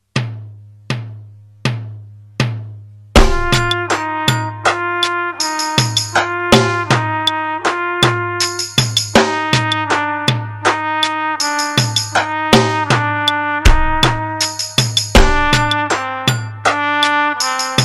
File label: easy slurs medium metro track